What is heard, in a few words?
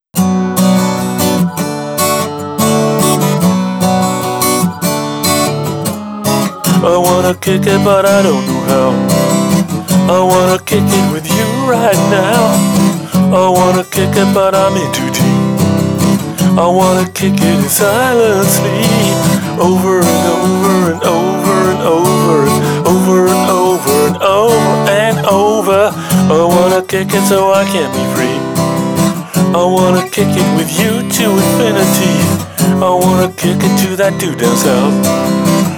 Muzak